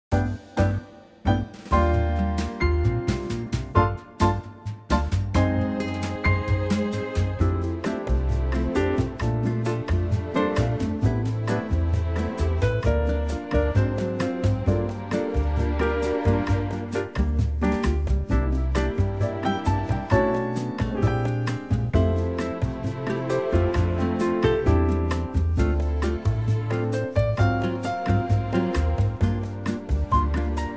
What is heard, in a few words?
F, female